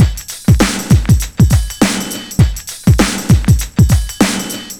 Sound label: premature beat, 100bpm